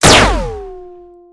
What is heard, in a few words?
gunshot; ash